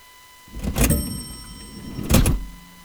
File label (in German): kasse sound